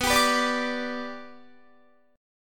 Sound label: B Minor Sharp 5th; strummed; Guitar